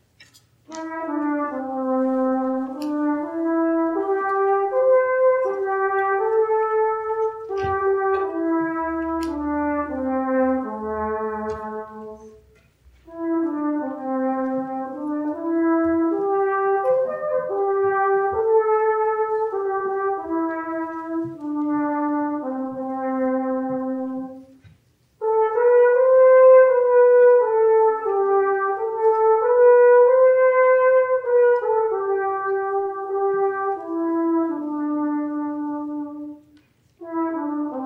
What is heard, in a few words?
flugelhorn; My Heart Is Filled with Thankfulness